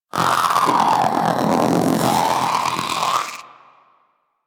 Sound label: Vocal Angry; Warframe; Pets; Zanuka; Zanuka Companion A